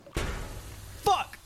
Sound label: clank